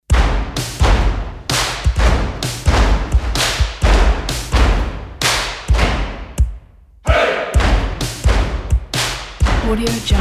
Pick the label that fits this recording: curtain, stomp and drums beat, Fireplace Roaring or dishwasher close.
stomp and drums beat